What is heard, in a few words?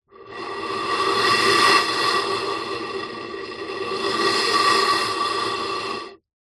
foley air blow inside small tube pipe recorded at opening